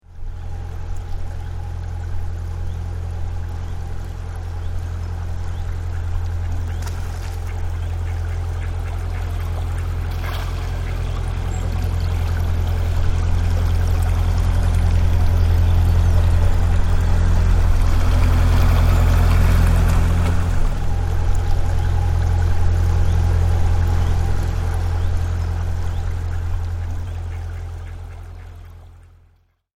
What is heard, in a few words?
Chugging Canal narrow Boat passing gently along on tranquil water with water sounds; Canal Barge; and even a duck splashing; Vehicles